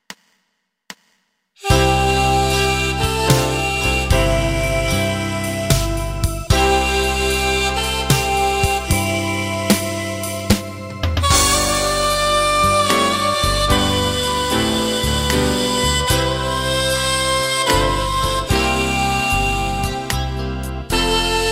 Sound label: Silent Air Sax